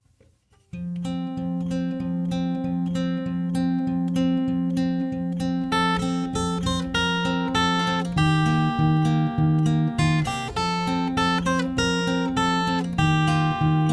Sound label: Solo Acoustic Arrangement Cover